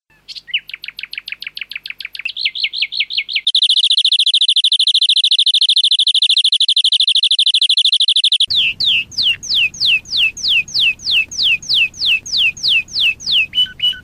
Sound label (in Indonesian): kombinasi kenari lovebird nightingale